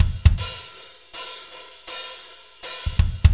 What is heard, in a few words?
Fast Shuff, Drumscapes Roland; Northstar